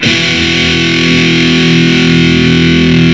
dim 2 sus, guitar; Amiga 8-bit Sampled Voice

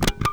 KLAK

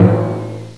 timpani drum, percussion instruments; Sound Effects for Multimedia - Volume 1

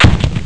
BOOM; Graliens

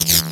welder

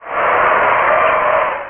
woo; Fashion Show

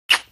kiss

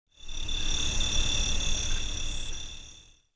CRICK, nature; PC Gamer IT CD 40; zones

